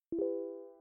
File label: connect